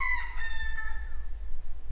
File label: Rooster Cry in Distance